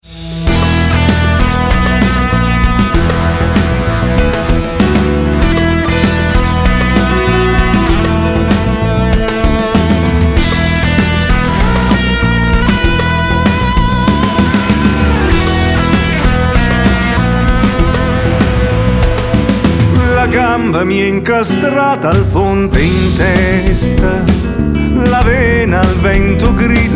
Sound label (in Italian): Canto di Achab